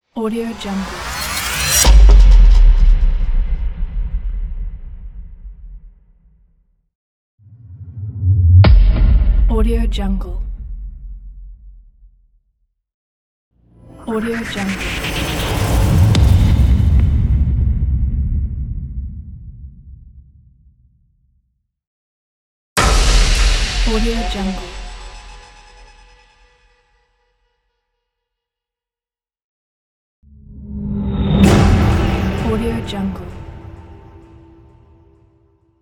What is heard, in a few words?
movie sub hit sound pack